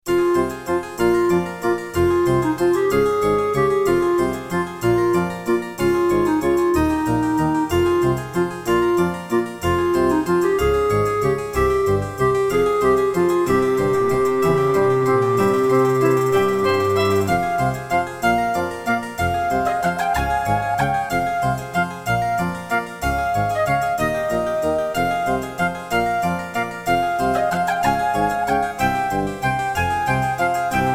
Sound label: Track 6, Roland CM-32L; Death Gate